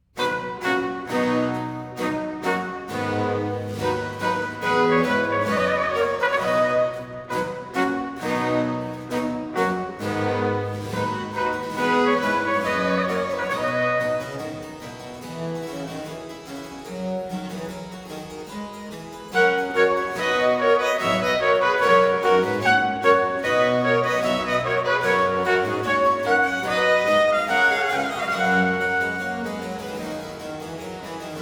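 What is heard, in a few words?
Cibell for trumpet, strings & basso continuo in C major; Purcell; Complete Chamber Music; Brilliant Classics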